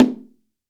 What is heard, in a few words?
BONGO, STEREO BONGO, Cuba; AKAI S6000 CD-ROM - Volume 5